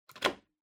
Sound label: Wall Outlet 3 Pin Plug In